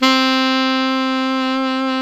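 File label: saxophone alto mezzo piano; Roland - LA Composer vol. 1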